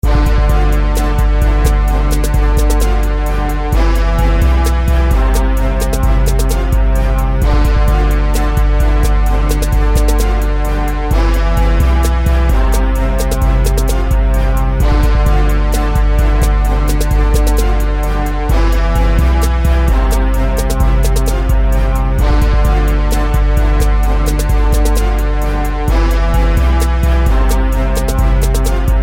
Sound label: Hip Hop